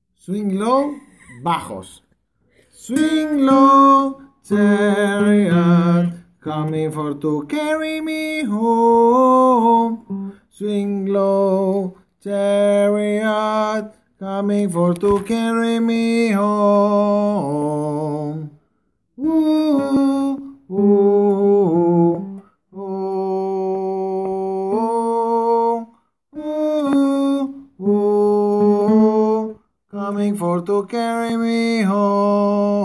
bajo

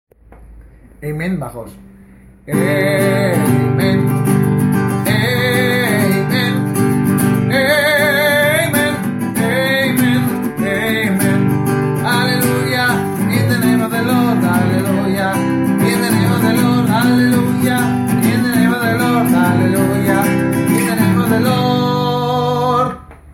amen voz baja

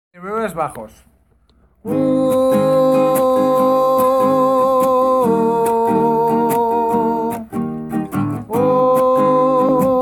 bajo

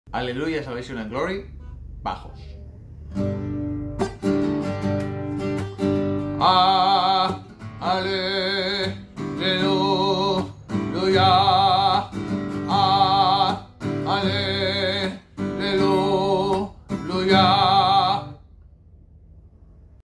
Halleluyah salvation and glory bajos

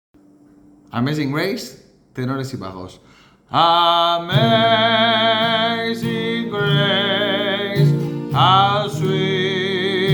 tenores y bajos; amazing grace

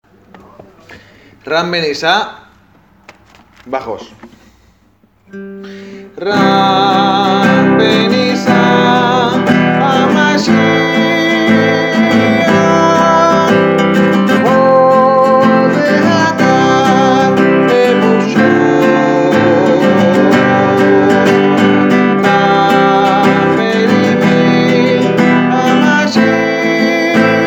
ram venisah voz baja

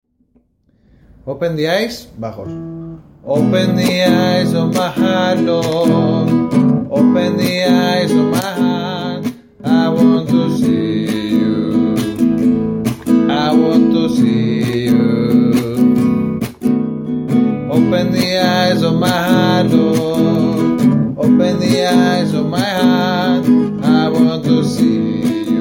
open the eyes voz baja

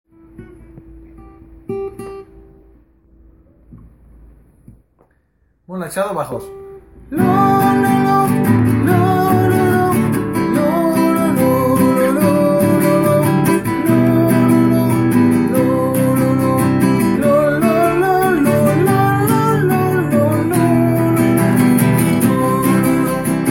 voz baja

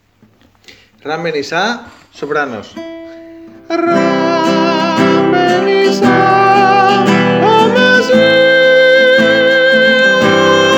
voz soprano

